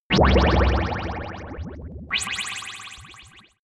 metalic water impact; whooshes rises; hits impacts

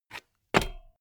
bmx bike pedal grip double regular

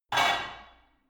barbell only replace reverberant hard

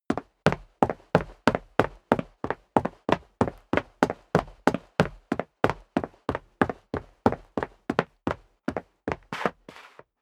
step sneaker concrete run sequence; schritte

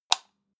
light switch on hard; schalter

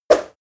whoosh short tonal; swooshes-swishes

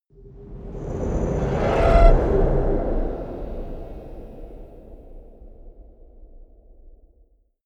haunted shout soft; whooshes rises

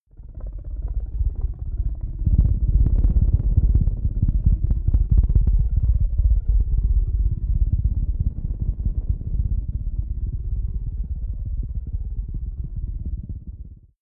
space rotor rocket drive; raumschiff

